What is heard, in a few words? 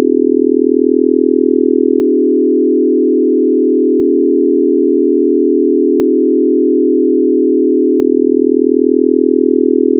mystery buzz